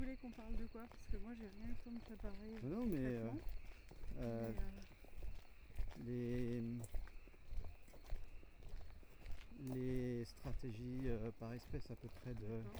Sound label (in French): essai, chiro